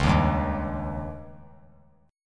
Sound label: Db Suspended 2nd Flat 5th; strummed; JGuitar